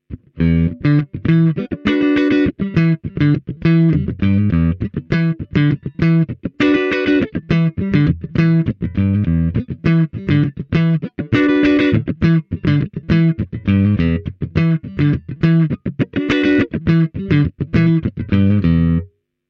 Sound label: neck, mp90